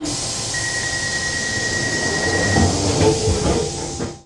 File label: doors closed, trains; general trains